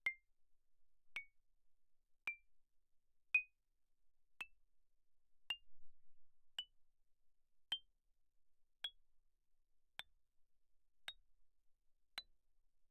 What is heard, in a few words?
xylophone hard rubber mf C7 B7; Percussion